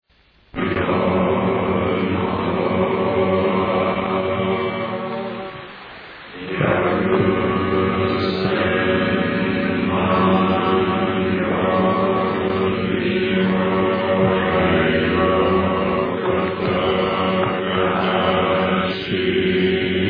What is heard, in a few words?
chant